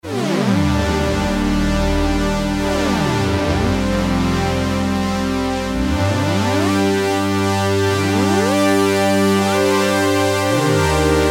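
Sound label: String, Memorymoog Classic Analog Polyphonic Synthesizer; 71 String 8; Factory programs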